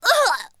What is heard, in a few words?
BOSS woman hurt